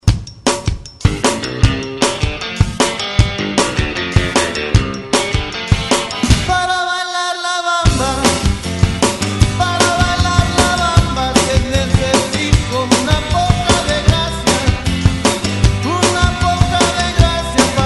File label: ReDrum 154 Bpm